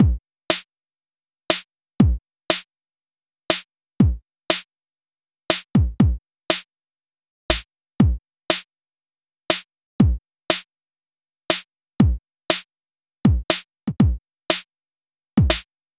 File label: Kick Snare, Chillout; Sonic Foundry (Sony Creative Software); Afterhours EDM; Chillout Service